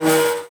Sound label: move, hinges drawers; Half Life Alyx